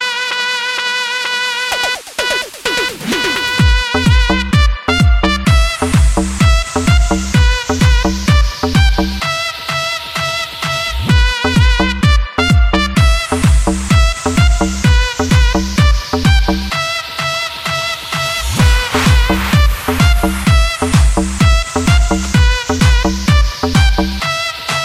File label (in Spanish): Trompeta guaracha